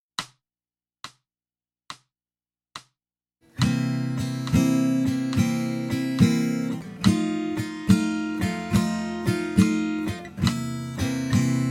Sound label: 12 Bar Blues in C, blues guitar; blues guitar methods